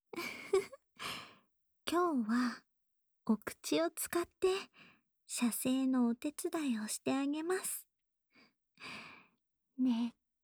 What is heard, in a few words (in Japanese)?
オナサポ用フェラチオ